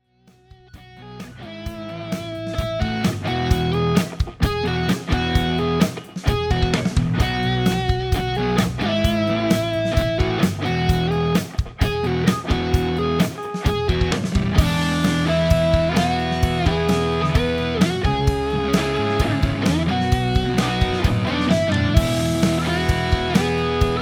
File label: Unplugged Sample Piece Electric